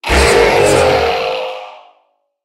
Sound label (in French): Cri Méga